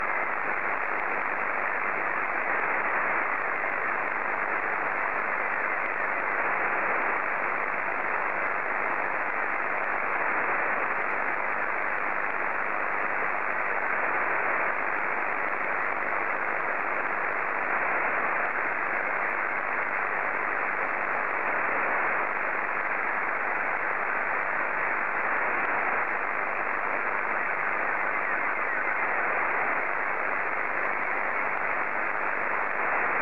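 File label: SSTV, Scottie 2; JA3OEN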